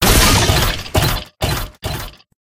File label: ulti; tick; Brawl Stars